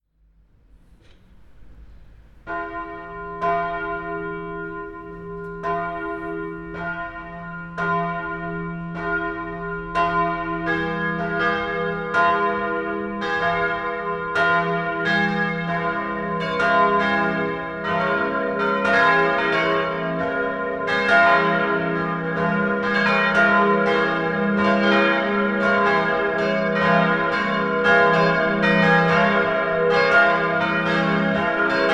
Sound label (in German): Glockenaufnahme